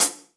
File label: Hihat Closed Shank Direct Natural; Drum Hits; Standard Hits; Ableton; Factory Packs; Session Drums Studio